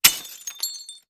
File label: Glass Breaks 2